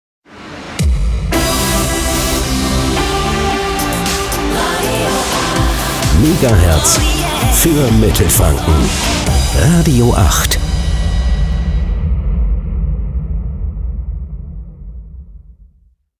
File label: Transition Radio Slow Pop to fast Pop; Radio 8